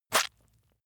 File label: leather impact mud medium squeeze